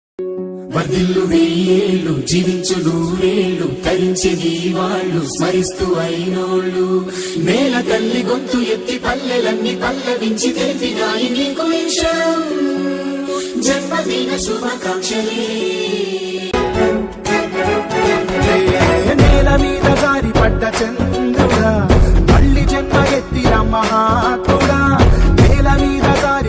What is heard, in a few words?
Happy Birthday Wishes Telugu music